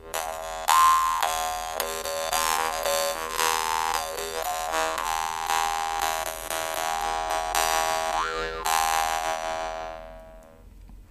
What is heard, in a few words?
dan moi; vargan